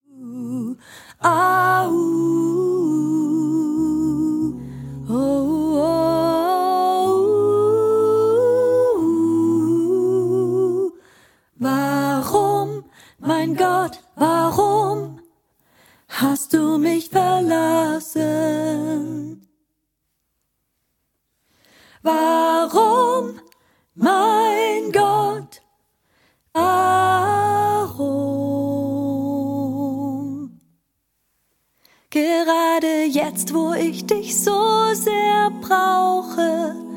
Lern Sopran und Solo

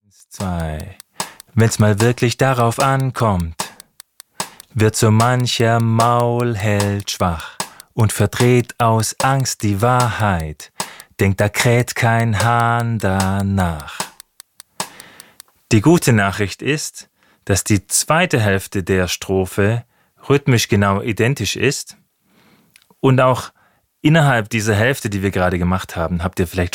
Rhythmus

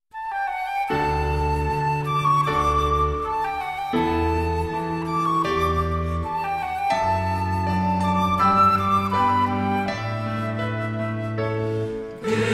Chor

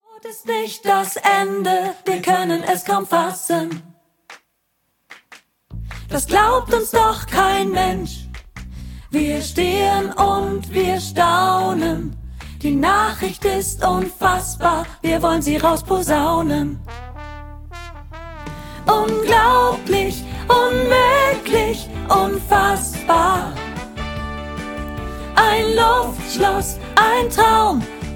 Lern Sopran und Solo

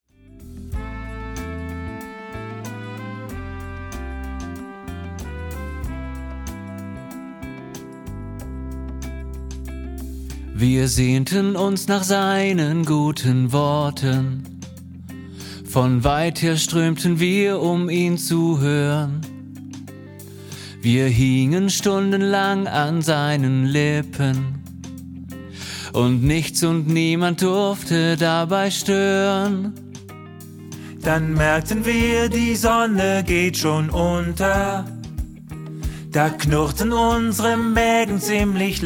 Lern-Bass und Solo

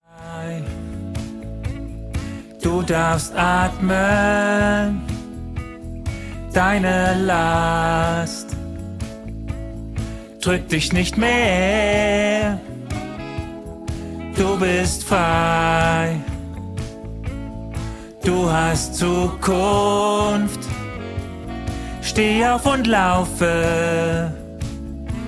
Lern-Bass

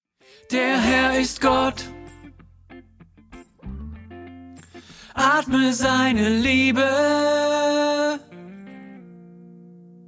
Lern Tenor1

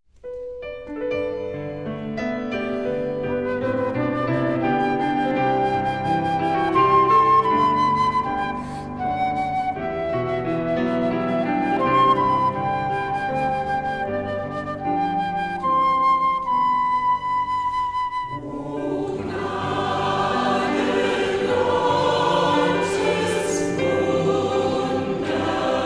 Chor, Kleine Chorgruppe; Jubilate Chor

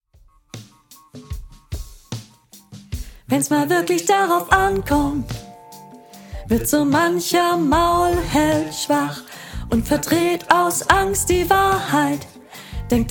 Lern Sopran

